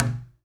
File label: Knock